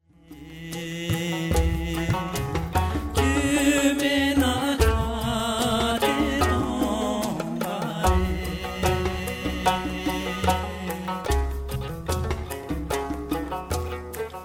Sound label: tibet music